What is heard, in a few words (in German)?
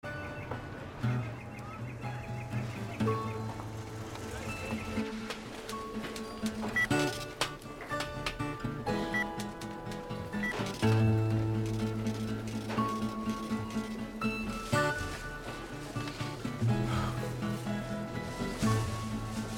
melodie